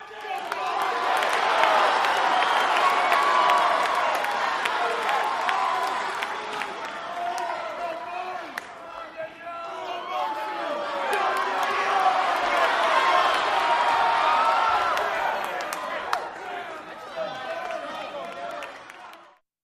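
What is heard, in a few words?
Crowd Walla During Match, boxing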